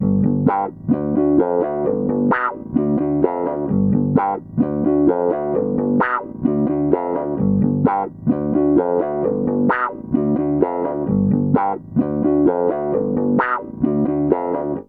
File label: Bass Guitar Loops